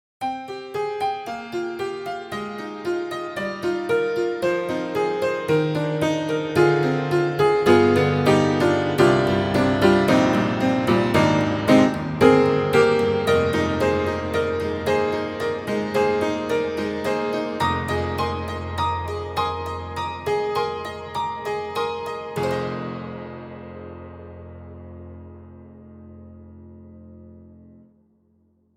Tack Piano; Upright Pianos; Synthogy; Ivory II; Cuved Tack

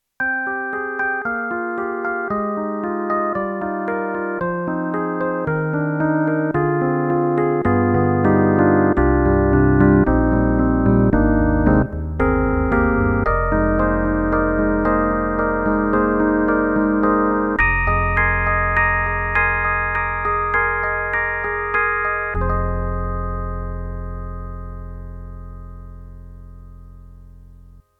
YAMAHA MU1000EX, Easy EP

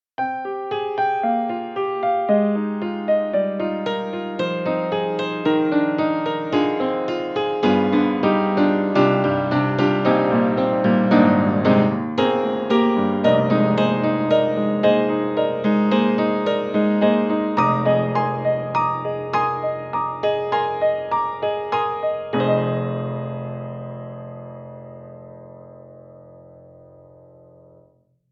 Upright Piano Close Waltz; Steinberg; The Grand 3

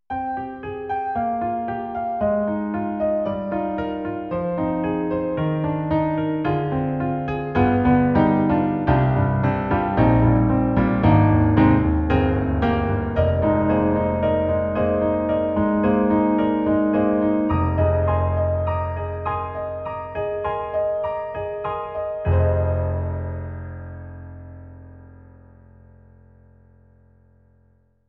EDIROL SD-90, Enhanced, SD Piano, Classic Piano

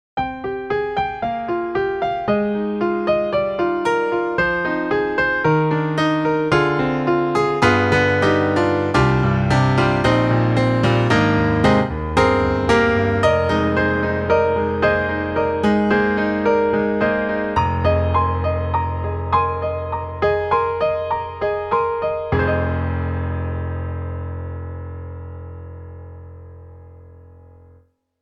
Piano; IK Multimedia; Granduation Day

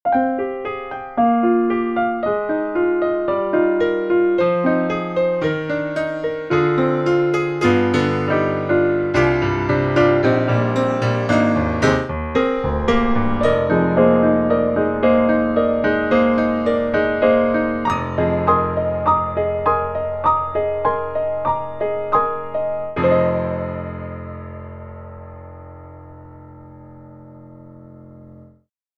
Grand On Mic; AKAI; SplendidGrandOn; EmulatorX

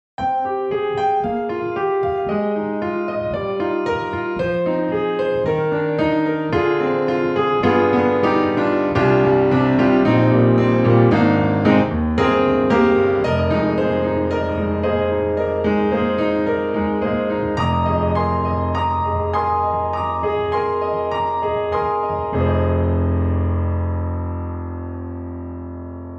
Grand Rhapsody Piano, Room for More; Waves

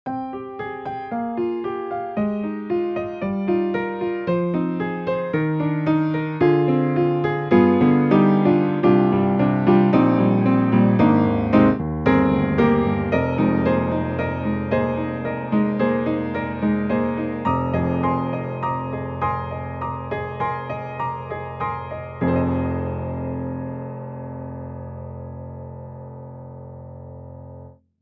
Modern Upright, Sound Board; XLN Audio; Addictive Keys

